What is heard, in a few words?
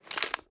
reload fast drum up; gmod; pi mike